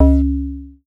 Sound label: C#, BELL GONG, Synclav FM; Big Fish Audio